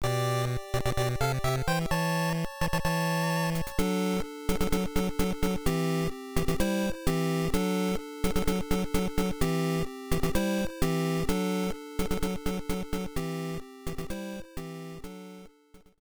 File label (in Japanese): レベルアップ; Medarot